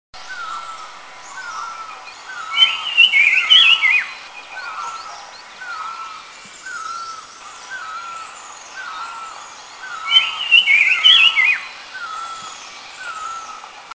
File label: Sikkim Wedge-billed Wren Babbler, Eaglenest, India